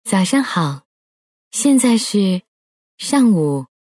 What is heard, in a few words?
Alarm Cloudy,天气动态闹钟 dynamic alarm 多云 Cloudy; Xiaomi; MIUI 12.5 China; MIUI12.5系统音效全收录